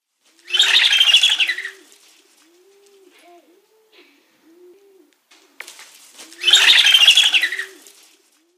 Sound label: Black Vulture